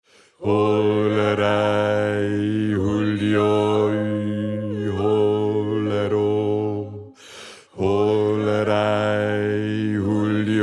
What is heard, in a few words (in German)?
Bass; Bauern-Ari